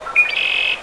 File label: red wing